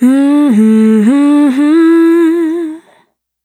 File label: Dry, Single Voice Melodic Loops; Singles